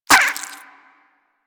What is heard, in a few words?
Vocal Tranq Grunt; Warframe; Hunting Critters; Nexifera